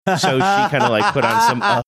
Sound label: laughing while speaking